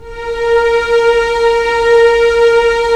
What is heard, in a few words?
STR, Roland LA Composer